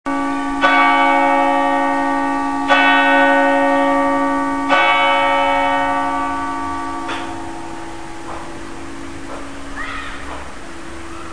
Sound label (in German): GLOCKEN